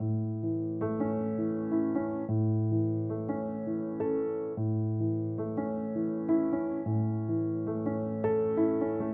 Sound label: piano loop 6 8 bastaki; piano pack